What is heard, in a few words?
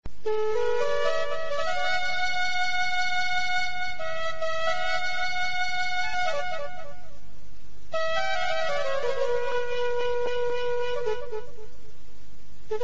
krish flute ringtone